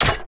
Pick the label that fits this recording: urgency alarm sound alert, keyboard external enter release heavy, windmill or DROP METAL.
DROP METAL